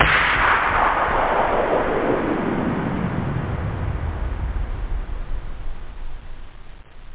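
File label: decay, explosion; da capo vol1; instruments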